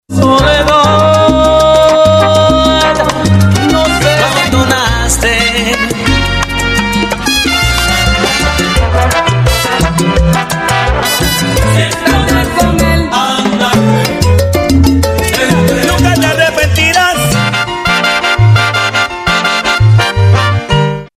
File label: salsa sensual